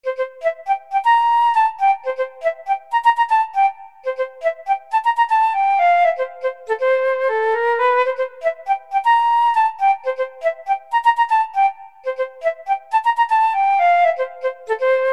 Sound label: Funk Study, flute